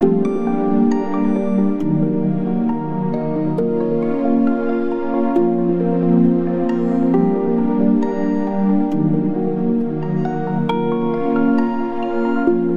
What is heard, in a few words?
Fantasm Forest (Conversation)